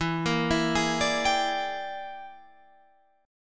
E 7th Sharp 9th Flat 5th Guitar Half Step Down, chord